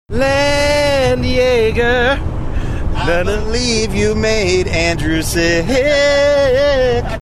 singing landjäger made sick

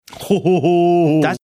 ho ho ho hooooo

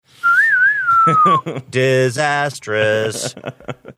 whistling saying disastrous

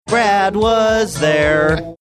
singing song

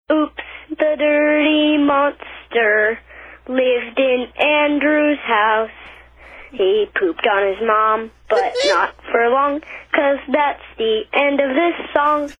singing song about oops dirty monster

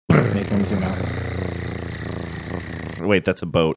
attempting drum roll saying wait thats a boat

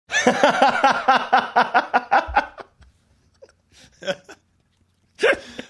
having a good laugh

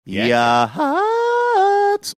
yodeling; yachats

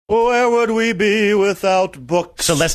singing where would we be without books

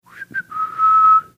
whistling

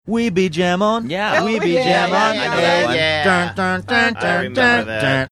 singing we be jammin

